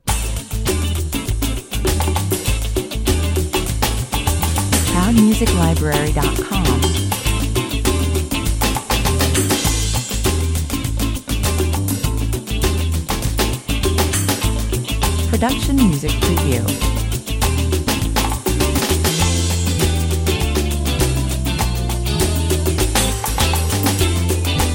This saucy, uptempo, uncanny little latino rocker with organ top line, 101 bpm